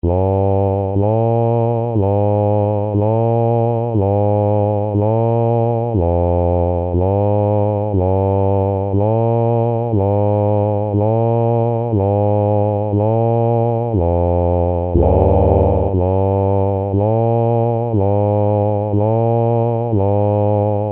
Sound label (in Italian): basso; free at last